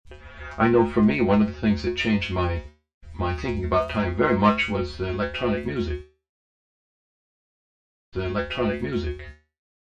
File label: vocoder; psp42